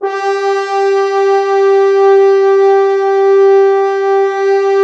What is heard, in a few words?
Orchestral Brass